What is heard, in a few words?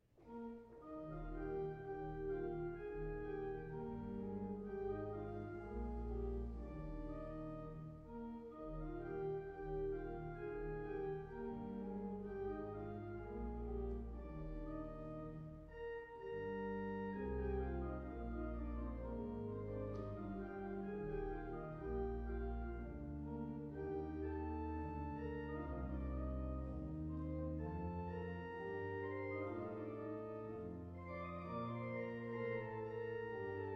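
zion hears the watchmen singing trumpet and organ; weddings; bach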